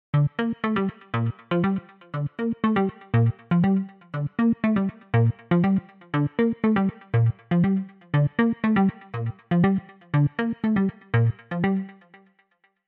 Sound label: Pluck Nothing Special